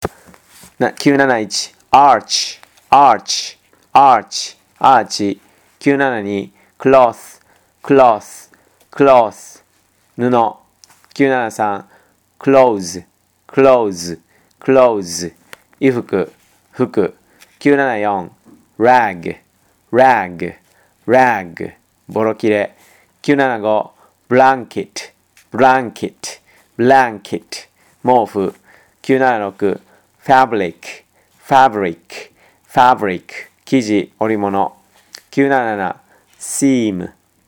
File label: tango